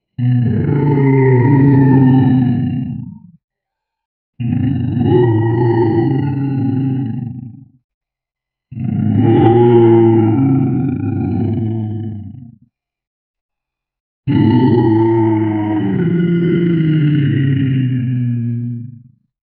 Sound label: a loud animal scream